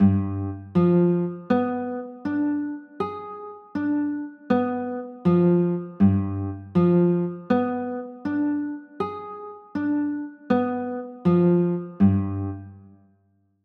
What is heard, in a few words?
g 7 b9, chords